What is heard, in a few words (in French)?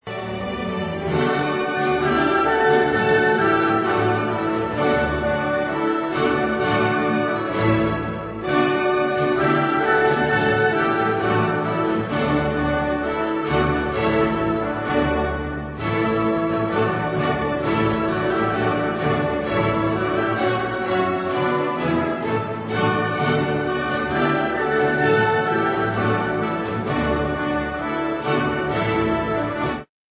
ode a la joie instrumental